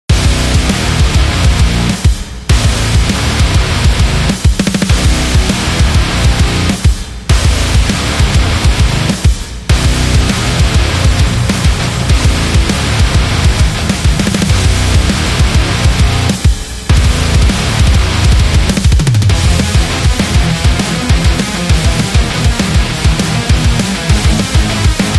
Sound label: Metallic